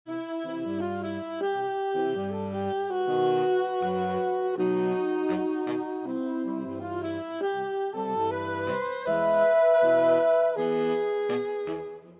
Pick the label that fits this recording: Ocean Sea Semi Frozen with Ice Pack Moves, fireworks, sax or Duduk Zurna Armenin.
sax